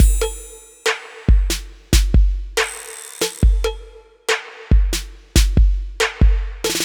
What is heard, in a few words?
Pansy Drums; Trap (Трэп)